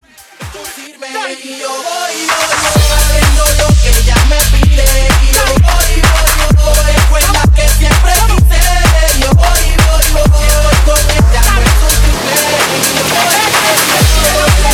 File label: Tech House 128 BPM; Cut Rework